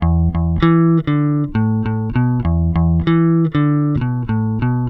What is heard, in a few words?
NUTS 98 F, Bass Grooves; USB Soundscan vol.30; AKAI